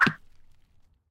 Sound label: arrow wood, attack impact